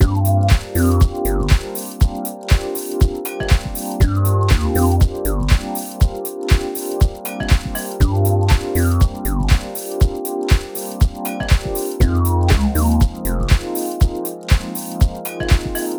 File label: Groove 120bpm G; Zenhiser